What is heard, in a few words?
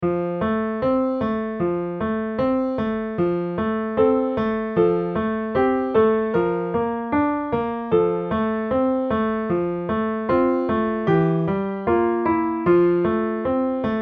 Piano; piano solos